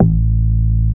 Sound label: DR. LOOP, BASS; X-Static Goldmine 3